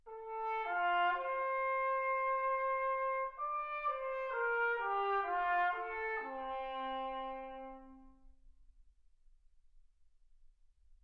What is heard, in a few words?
trumpet